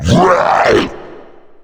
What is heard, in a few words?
war fire scream